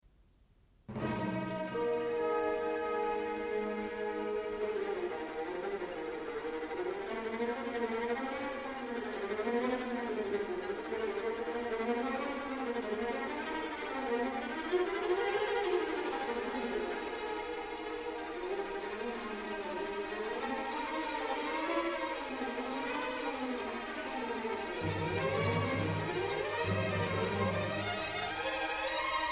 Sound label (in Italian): allegro molto; les scandinaves